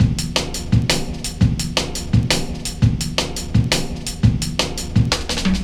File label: Break, Drum & Bass, Beats & Breaks